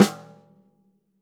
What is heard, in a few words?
snare snares on; JazzFunkKit